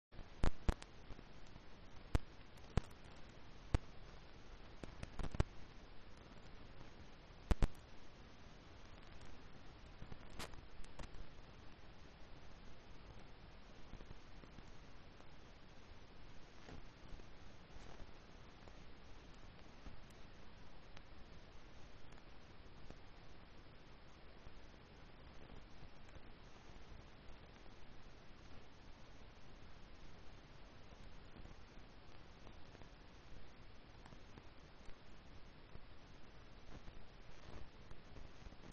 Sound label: riverside; Kyoto U; Ashiu